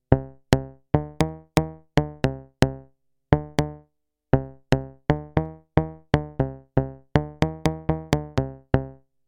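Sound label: Channel Moog 2264 JR Slow Attack; Heritage Audio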